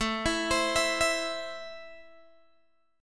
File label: A Minor A down Guitar Open G